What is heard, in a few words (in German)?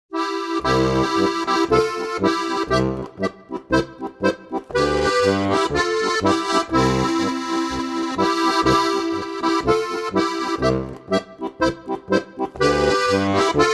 Polka